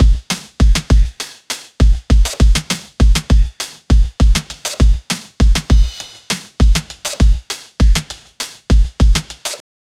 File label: drum kit, hi hat